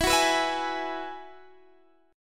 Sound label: Ab Minor 7th Sharp 5th